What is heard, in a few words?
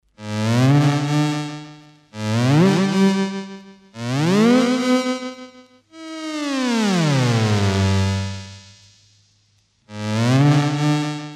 ascend + descend seq, Electronic Sound Patterns; His Master's Voice